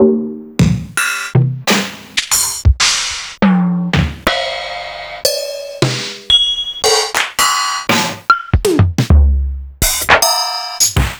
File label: Fairlight IIx